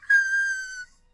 a puppy cry; korone; vtuber

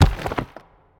symbol Landed; asgardian stones

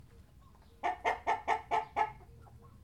single Chicken

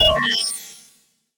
zone comes to focus

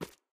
land dirt

